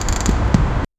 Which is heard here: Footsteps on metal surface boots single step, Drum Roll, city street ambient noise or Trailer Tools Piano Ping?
city street ambient noise